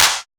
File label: Clap, Techno; Techno Sounds 2024